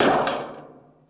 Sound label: bleacher land; Golden Crayon Sounds